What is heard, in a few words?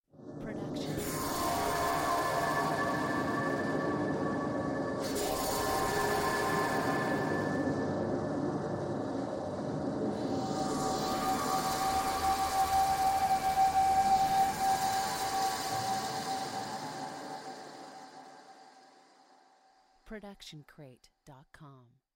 under water screams; soundscrate